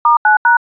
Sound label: dtmf star 9 hash